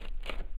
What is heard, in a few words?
jump, footsteps, cement; chief